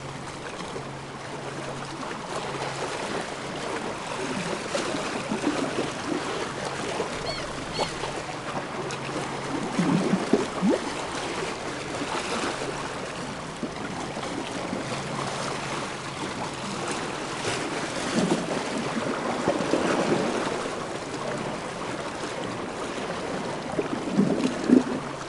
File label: water ambience; gauntlet seven sorrows